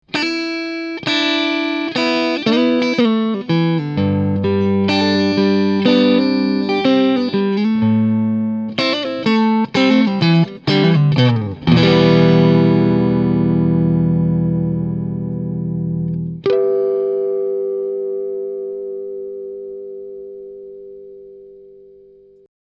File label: Hybrid Neck Pickup, guitar pickups